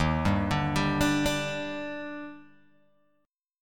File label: Eb Major 7th, Guitar, Open G